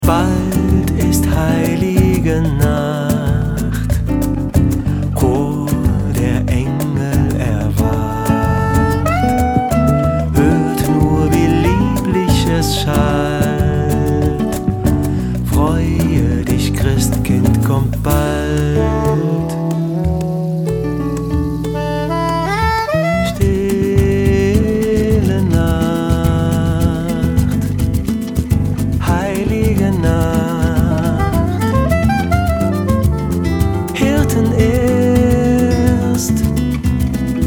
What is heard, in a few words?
Christmas Samba Jingle; Weihnachtslieder